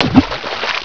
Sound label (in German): Ton Stein ins Wasser; Der Weiher; Riedlhuette